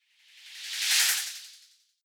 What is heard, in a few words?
whoosh simple flutter phase high